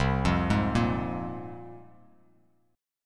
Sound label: C 7th Suspended 4th Sharp 5th, Bass Standard; C7sus4#5 arpeggiated